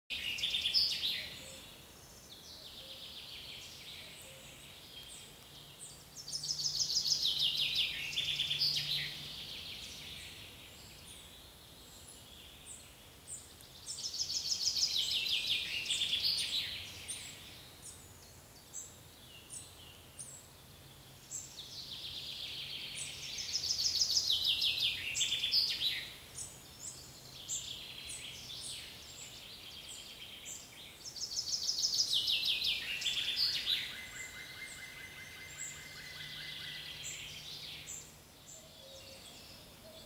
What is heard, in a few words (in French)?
Forêt et chants d'oiseaux